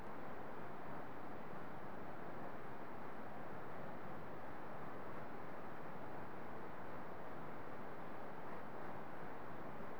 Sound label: wind; twinStick